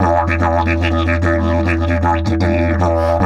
150, DIDGE RIFFS

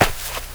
DIRT, footsteps; AKAI S-Series CD-ROM Sound Library VOL-6

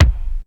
Kick, Ensoniq ASR-X Pro

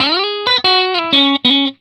RIFF 120, Vintage Blues Guitar

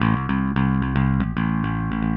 Bass, Indie Rock; Sonic Foundry (Sony Creative Software); Indie Rock Anthems; Session 01 - Brighton

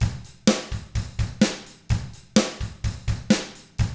127 SURF ROCK

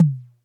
Toms, Elektron MachineDrum SPS1 MKII

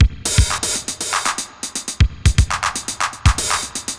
Spring Verb Compressed TR 505 120BPM

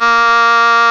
REED A#; Big Fish Audio; Synclav FM

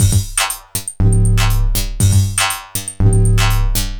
Beat, Drums, Eclectic Electronica

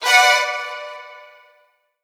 STRGS, Roots Funk; USB Soundscan vol.41; AKAI

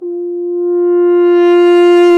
brass, F.Horn Solo; F.Horn FX; F.Horn Swell

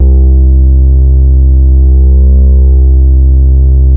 BASS STOP; Masterbits - Vintage Voltage; VV BASSES

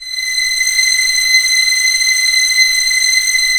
STR F#5 FF; Sound On Sound; Peter Siedleczek's Orchestra

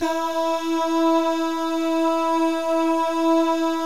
DAH, Vocal Jazz FX; Spectrasonics; Vocal Planet; JAZZCOMBO

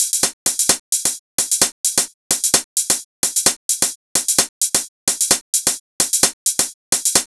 Hi-Hat, Afterhours EDM

